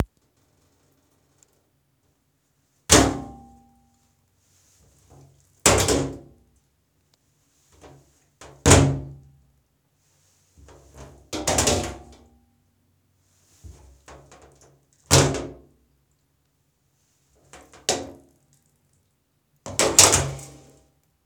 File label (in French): Chute Objet